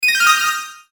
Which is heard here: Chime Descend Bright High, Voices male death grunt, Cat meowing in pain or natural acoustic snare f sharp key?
Chime Descend Bright High